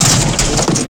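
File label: RUMBLING, metal impact; Total Control - Action Cutz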